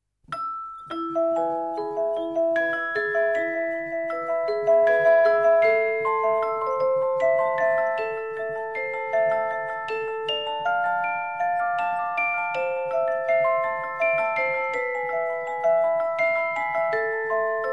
CARILLON

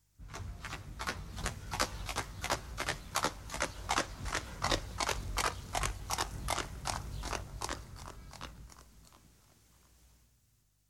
CAVALLO AL TROTTO

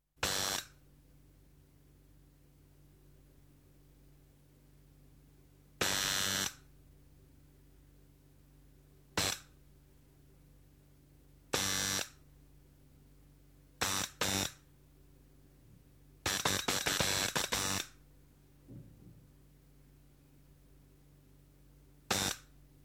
ACCENDIGAS ELETTRICO

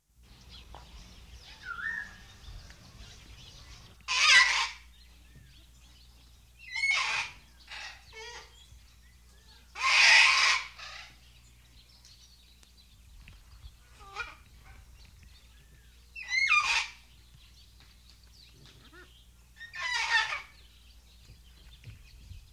UCCELLO ESOTICO

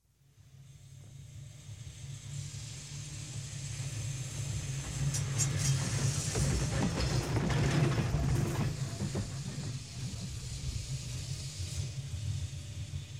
TRAM FILOBUS FILOVIA

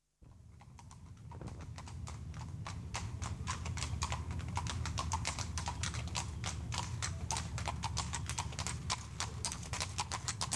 PASSAGGIO CAVALLI AL TROTTO

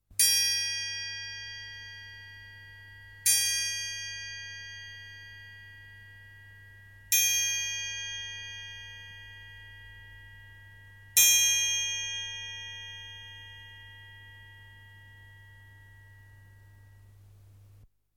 OROLOGIO

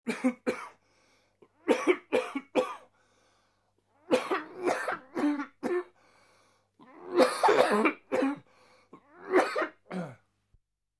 TOSSE

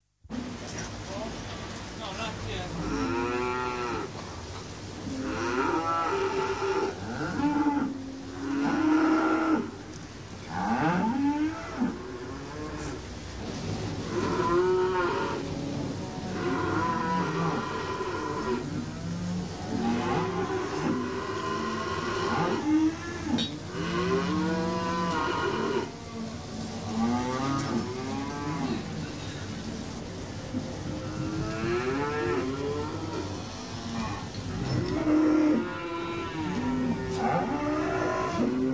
MUGGITO MUCCHE

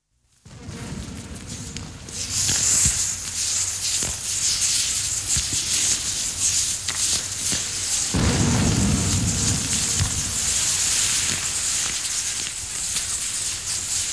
VOLIERA E FOLLA

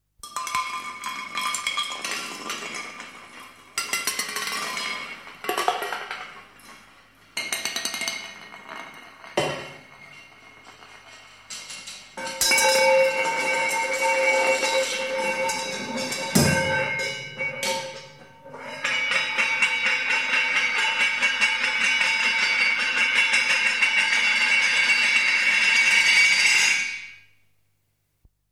CIOTTOLI CHE ROTOLANO

